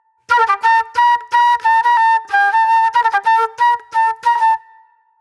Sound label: J-Funk Flute, Call And Response